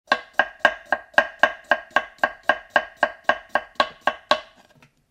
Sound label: cook cutting; anemoi